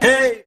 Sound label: hey SUNG